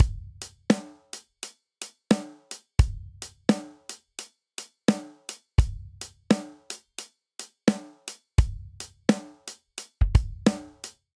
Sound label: Inbetween Hip Hop Intro 86 bpm; Drum Booth